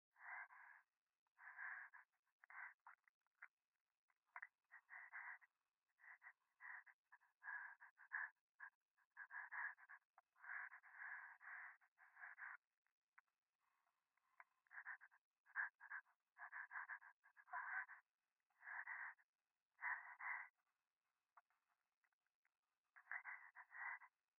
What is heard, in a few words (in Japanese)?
電話 右耳 快感 吐息 山奥 夜